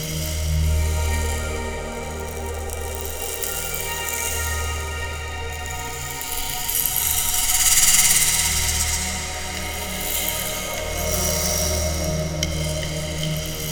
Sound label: Synvoxperc Scape